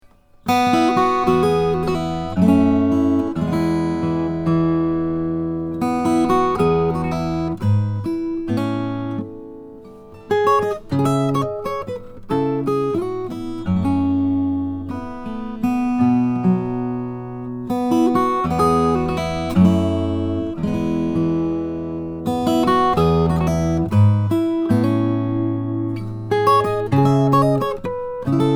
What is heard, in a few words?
taylor ddsm ltd edition black